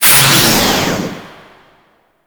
Grineer Space Pod Thruster Reverse Burst, Vehicles; Warframe